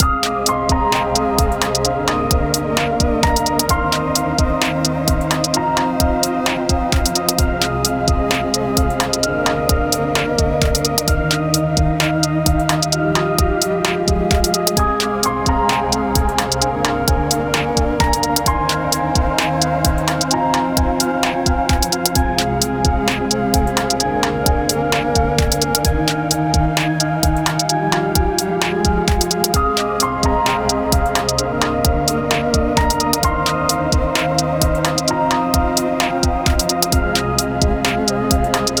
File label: Song Instrumental